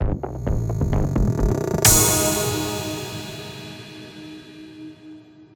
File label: Transition; Everspace 2